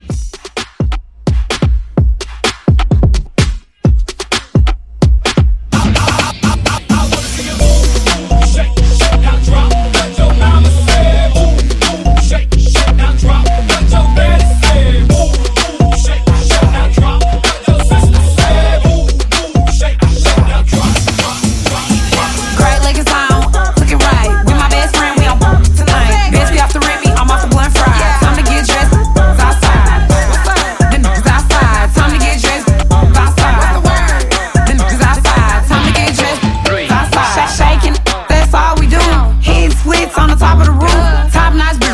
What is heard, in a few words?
Club Hype Edit 128